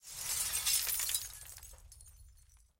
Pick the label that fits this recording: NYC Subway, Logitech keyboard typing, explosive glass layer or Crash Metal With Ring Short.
explosive glass layer